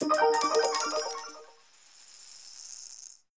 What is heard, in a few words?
coins mono; music visualizer